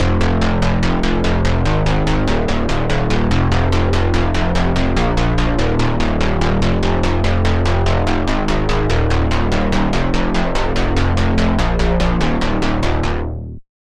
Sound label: Bass, Serum 2; Bass - Wice; Routed Sound; Serum 2 Expansion - Reality Maker Vol.1